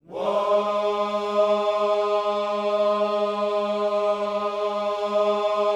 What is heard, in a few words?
WHOA G#3, Vocal Planet Gospel; Spectrasonics; Vocal Planet Vol.1 Gospel; akai